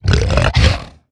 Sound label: hoglin angry, 疣猪兽：怒吼; 进入攻击状态; Minecraft; 我的世界; 疣猪兽是一种生成在绯红森林的攻击型生物。它们是目前唯一可繁殖的攻击型生物。